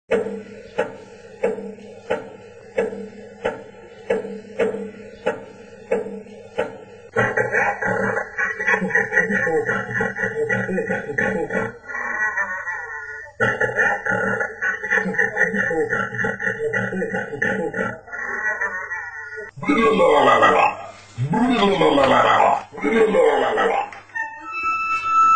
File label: clocktower; blackgangchine